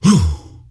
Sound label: Attack; Ley Guardian Eregos